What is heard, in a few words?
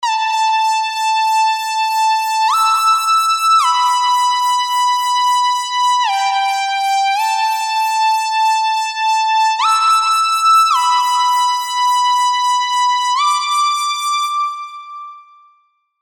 Haunting; MYON; alonso myon diva soundset vol 1